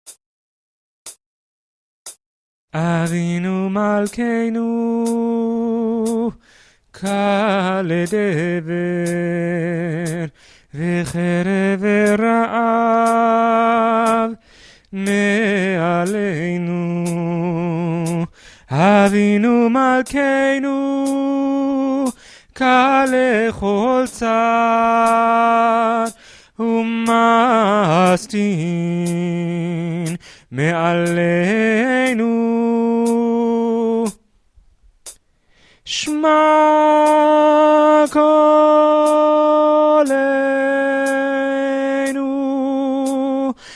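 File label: soprano; avinu malkenu; BCC choir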